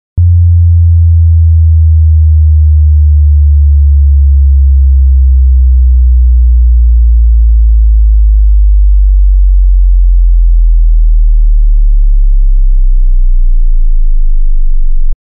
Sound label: Bass Sweep